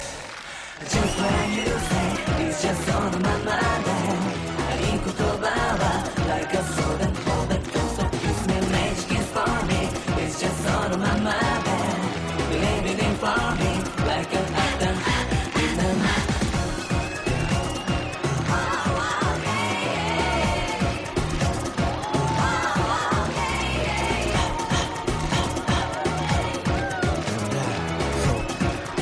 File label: Ha-ha